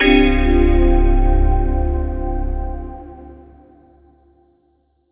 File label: chime